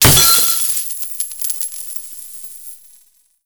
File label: explosion parking meter; Explosions